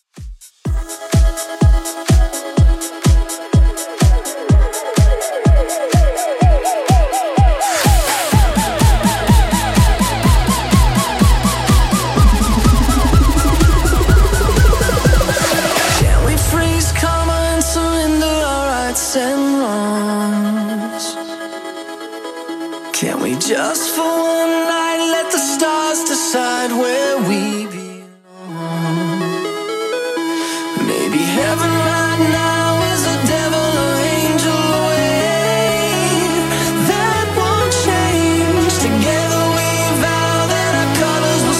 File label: 2ks Redrum 125bpm